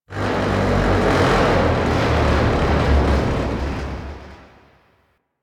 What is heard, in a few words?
Destruction Texture; Warframe; Corpus; Railjack